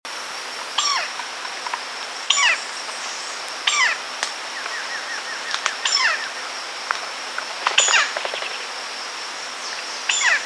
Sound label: robust woodpecker